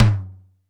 JAZZ T, JAZZ KITS; Crypton; DrumBase5000

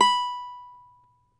CAVAQUINHO B, Guitars; Spectrasonics; Hans Zimmer Guitars VOL-2

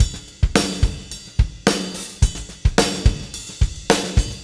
PULL RIDE; Spectrasonics

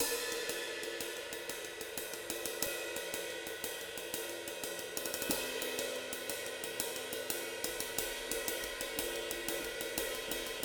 JAZZY 90 CYMBALS, DRUMS LOOPS; BASEMENT DRUMS VOL 2

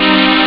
string; turrican; Amiga Soundtracker Sample Packs

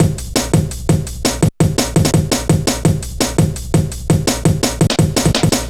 Step Ez, Drum Loops, Jungle, 168.5

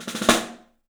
STEEL ROLLS; 3D Drums

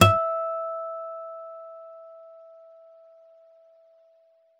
STEEL HARM, GUITARS; Synclavier Sampler Library

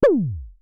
Synare Filter; Star Instruments Synare 3

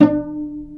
pizzi total, stringed; East West; Global Sounds

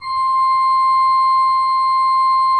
C5, Cathedral Organ; ILIO; Propeller Islands Cathedral Organ

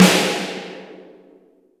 NCSN2RRFMS, drums; Best Service; Real Mega Drums VOL-1

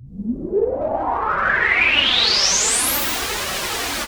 Juno-60, Filtered Noise

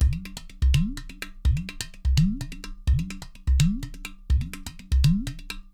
LOOPS 84, Percussionworks; Sampleheads; NYC Percussionworks; 90 s Sample CDs

